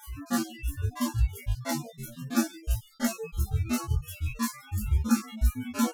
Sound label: Groove